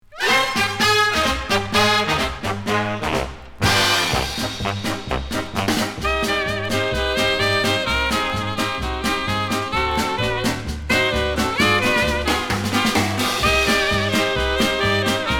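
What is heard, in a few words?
instrumentalnyiy ansambl, instrumental ensemble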